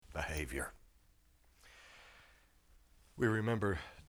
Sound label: breath; smackdown